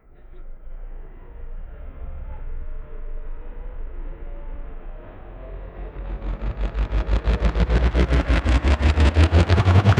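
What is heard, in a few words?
marble run race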